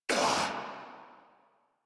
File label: Pain Corrupted Grineer Male